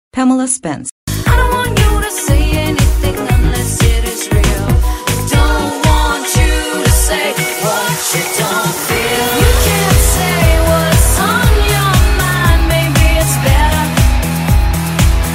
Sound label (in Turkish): ingilizce vokal